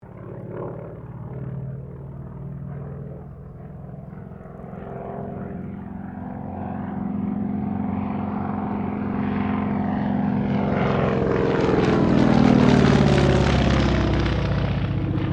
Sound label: AIRCRAFT CAC WIRRAWAY PASS; CA-16 Wirraway; Airplanes; Vehicles